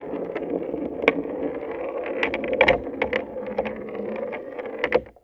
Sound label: In The Bath, Marbles, contact mic